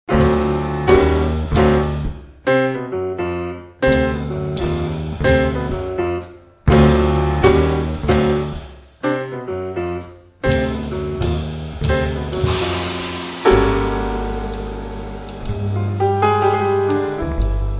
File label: Monk Keys